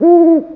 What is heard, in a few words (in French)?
Hibou